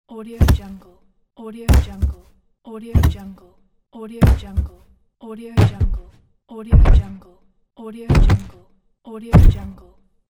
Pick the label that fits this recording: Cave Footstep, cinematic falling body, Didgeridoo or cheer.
cinematic falling body